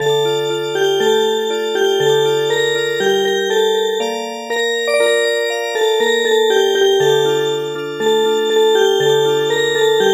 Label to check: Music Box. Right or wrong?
Right